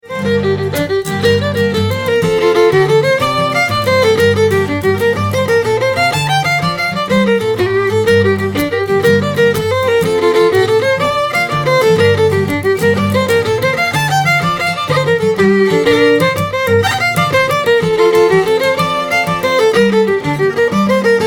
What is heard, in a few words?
Jig; Brenda Stubberts Jig